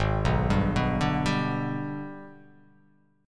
Minor Major 7th Flat 5th; G#mM7b5; arpeggiated; 4,2,5,4,2,2,x,x

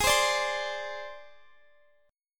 Ab Major Flat 5th Ab, Guitar Open F; strummed; x,x,20,19,17,19; down

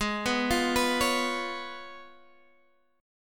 C sharp 7th, G sharp

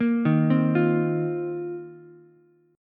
C Minor 11th C Ukulele C6; arpeggiated; JGuitar

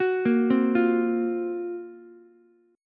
F sharp Major 7th Flat 5th, Ukulele; arpeggiated; JGuitar

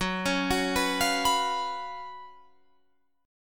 F sharp Major 7th Suspended 4th, Guitar, Drop D; chordshape; down

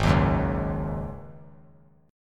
Ab 7th Suspended 4th Ab down; Ab7sus4 strummed

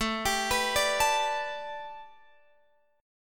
A 9th Suspended 4th, down, Guitar, Open F; A9sus4 arpeggiated

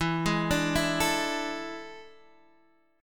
E 7th Add 11th

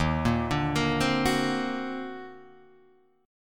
Eb Minor Major 13th, Guitar, DADGAD; EbmM13; arpeggiated; JGuitar

